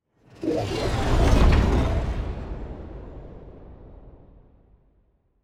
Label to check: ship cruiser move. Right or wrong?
Right